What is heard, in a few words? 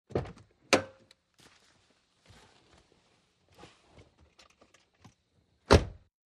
Montero Entering Int, 1998 mitsubishi montero int.